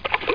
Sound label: splash; ProOne: Netcracker Netscape Navigator